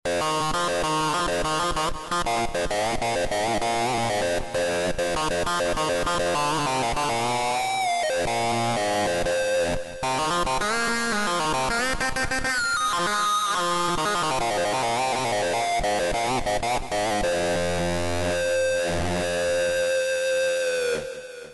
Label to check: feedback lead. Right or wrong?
Right